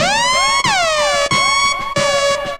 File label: alarm